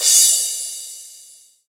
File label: crash cymbal single shot g sharp key, Royality free drum crash tuned to the G# note